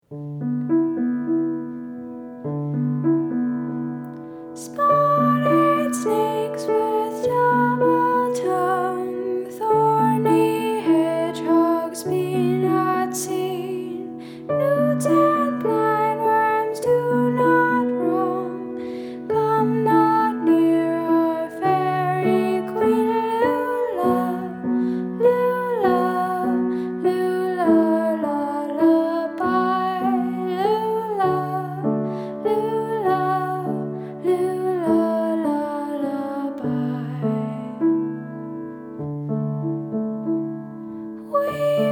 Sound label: Lullaby, piano